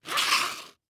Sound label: Foley Footstep High Heels Scrape On Cement Quick; Foley Footsteps